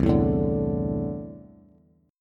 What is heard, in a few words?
C 6th Add 9th C down Bass; strummed; JGuitar